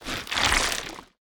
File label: deployable idle; smite; god ganesha skin11